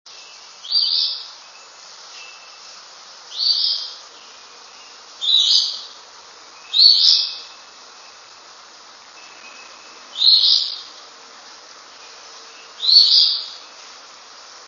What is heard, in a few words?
phoebe; birdsongs